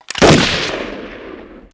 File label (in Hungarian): mesterlövész puska; Pure Pinball